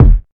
phat kick D sharp key; kick drum samples; one shots

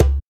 sharp tom single hit c sharp key

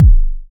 steel kick drum g sharp key

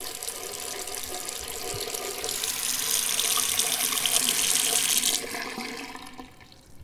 bathroom sink faucet

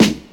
00s original hip hop snare sound a key; snare-drum-samples

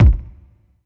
old school long tail reverb kick one shot b key

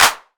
hand clap sample c sharp key; single hits one shots

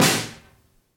sizzly snare single hit c key

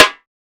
sparkly acoustic snare sound c key; tuned drums c key

